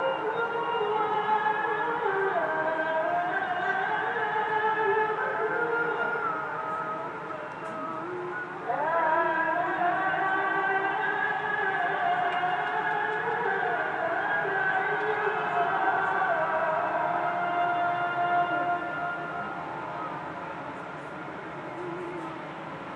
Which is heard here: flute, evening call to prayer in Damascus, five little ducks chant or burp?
evening call to prayer in Damascus